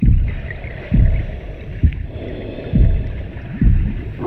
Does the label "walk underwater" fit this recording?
Yes